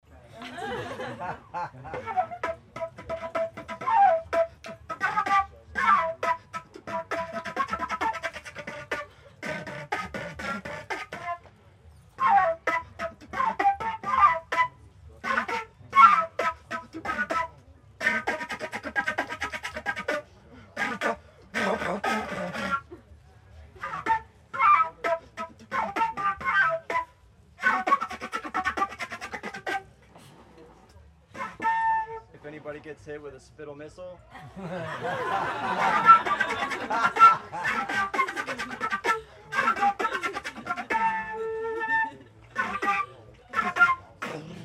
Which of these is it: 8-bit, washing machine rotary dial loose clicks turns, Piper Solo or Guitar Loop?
Piper Solo